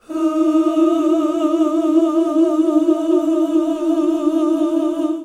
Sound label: OOH EB3, GOSPEL; Best Service; Hallelujah; GOSPEL 10